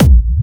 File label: Bassdrums Dirty, Kicks; Vengeance Samples